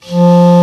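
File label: Clarinet p F2; Multisamples; Digital Sound Factory Virtuoso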